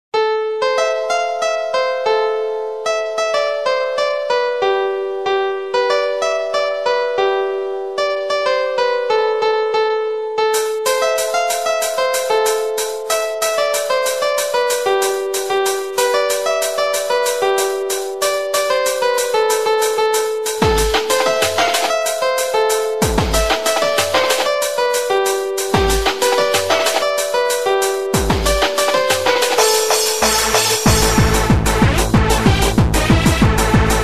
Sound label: Lezginka Russian Georgian